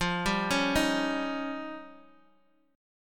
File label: F 7th Suspended 2nd Sharp 5th, Guitar, Open D